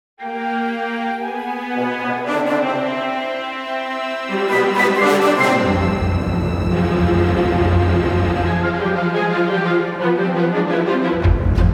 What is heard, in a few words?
ORCHESTRAL STEM